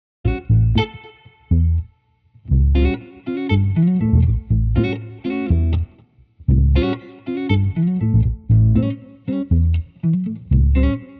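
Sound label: Street Guitar Bass Jazzy Funk, 120 bpm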